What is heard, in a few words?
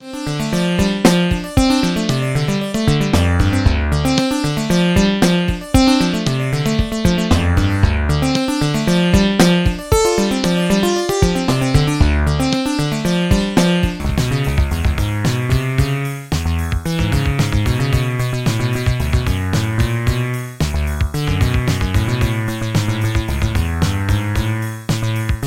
arp 2600 bass